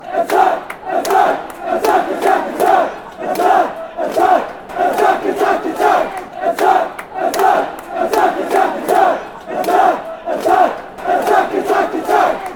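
attack attack attack, football songs and soccer chants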